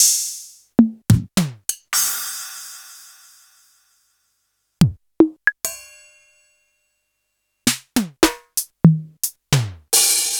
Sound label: Roland U110 Rock Drums; Card SN U110 10